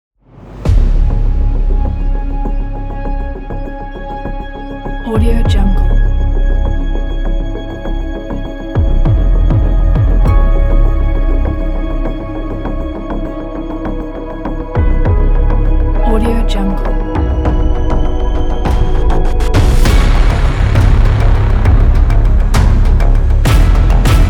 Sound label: cinematic trailer intro ident